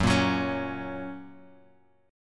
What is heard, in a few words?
F sharp Major, Guitar, Open G